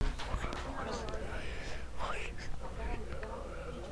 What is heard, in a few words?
horse